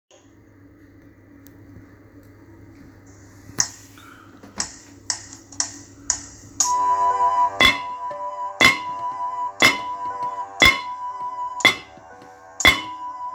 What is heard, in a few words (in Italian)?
percussioni; musica attivita svolta durante la didattica a distanza